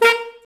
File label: SAX A. STAB, Alto Section; Roland L-CD702